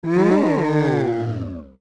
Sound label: Moan; Pamela's Gibdo-Dad moaning